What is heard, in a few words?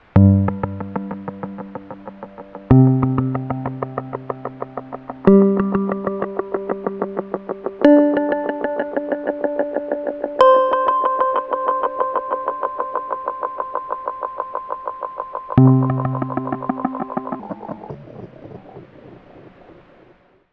Plucks FX; Cherry Audio Mercury-4